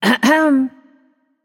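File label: clear throat, female voice; smite; voicepack; hera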